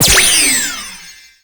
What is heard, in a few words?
trick jump; sonic